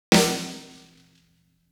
フラム

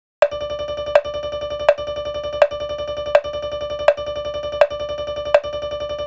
クリック

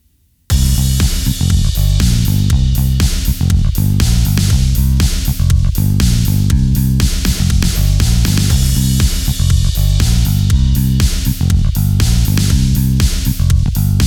グルーヴ

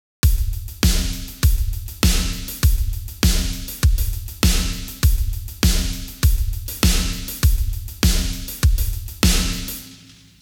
16ビート強弱

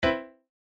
ドミシの音

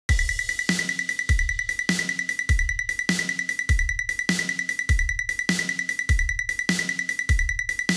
シャッフル細かいノン; shuffle; rythmkan

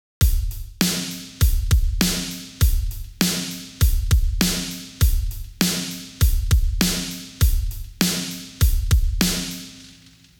8ビート強弱ハッキリ, drums

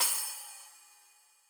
スプラッシュ, drum; drum-yougo

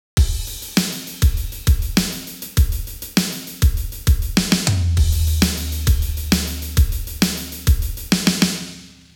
メトリック, drums

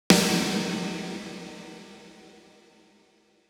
リヴァーブ, drums

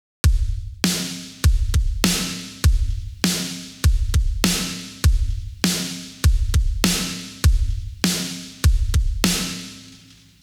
8ビートキックとスネアのみ強弱

